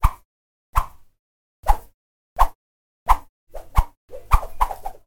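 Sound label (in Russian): размахивают мечом в воздухе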